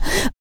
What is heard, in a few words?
HIT, Jazz FX FEM PERC